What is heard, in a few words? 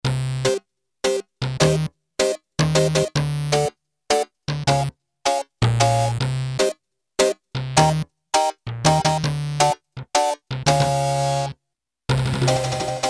MURMUR DELAY, Boss SX-700; Factory Preset